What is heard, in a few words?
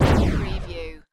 Laser Cannon Single; Sound Effects Bible